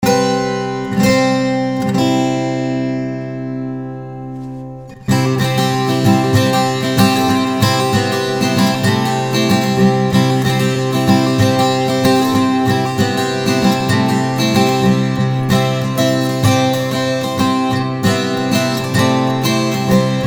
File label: Open C Americana Guitars